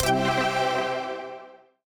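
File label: ultrafast big win; Criss Cross 81; wazdan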